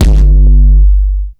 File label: base drum; DRUMS